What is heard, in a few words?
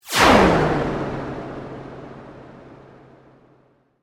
Sweep Mechanical Medium